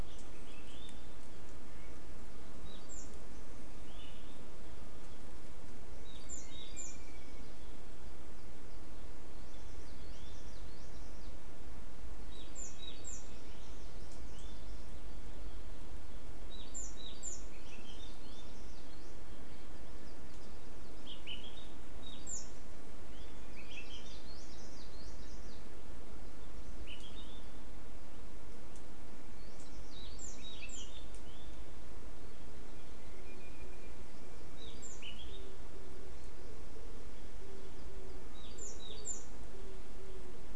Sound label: mainly bird cluster